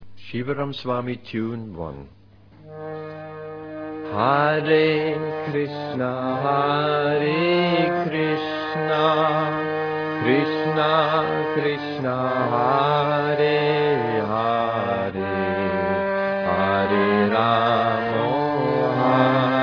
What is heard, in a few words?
tune